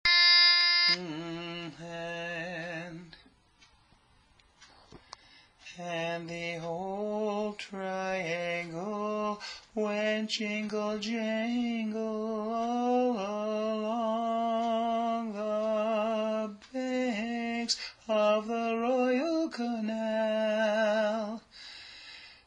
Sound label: tenor; Auld Triangle